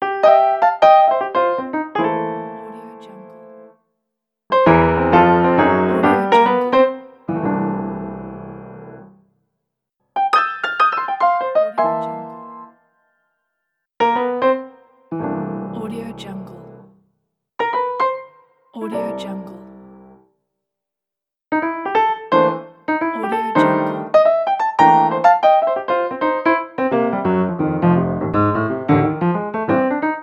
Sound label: blues jazz piano licks ident pack; redoctopus